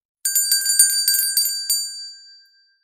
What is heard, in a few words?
bell